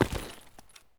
default, actor step; Soundscape Overhaul

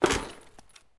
tin, human step; Soundscape Overhaul

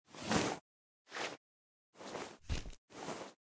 patch apply, outfit; Outfit Animations

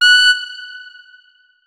notes, keyboard saxophone; Hideout Furniture

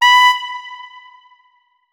notes, saxophone; Hideout Furniture

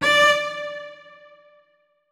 notes, keyboard; Hideout Furniture

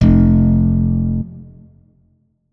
notes, guitar; Hideout Furniture

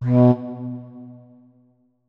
flute notes; Hideout Furniture

